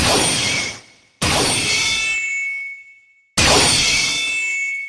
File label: clash of swords 3 hits